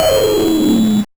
Noise, 8-bit, Bitty Noises

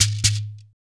shaker, Afro Shakers, Shekeres; Roland LCDP14 Africa VOL-2

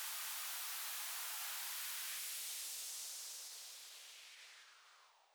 FX Downlifter Noise, 128BPM; Multi Drum Loop 01

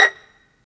STR PIZ VL, String Sections, Violins Pizz; Roland LCDP13

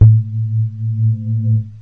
bass, synths

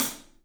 PAISTE HAT, Drums; 3D Sound

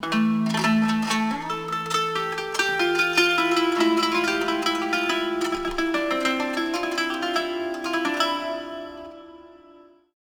SIERRA GUI

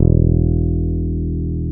FEND P-BASS E1

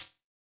Yamaha DJX 2B, Rh Box HH Closed

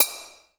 BELL JPN, JAPANESE PERCUSSION; AKAI S6000 CD-ROM - Volume 5; Ethic

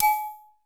SHAKER BEL, TONAL PERCS; Crypton - DrumBase5000

